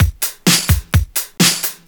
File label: Straight Loop 128 BPM, House